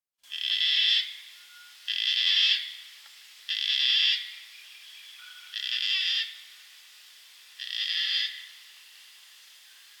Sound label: gang gang cockatoo; gang-gang cuckatoo